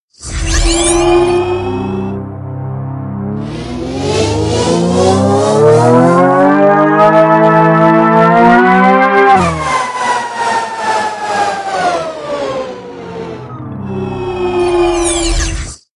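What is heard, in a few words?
hi-tech engine, Active Sound System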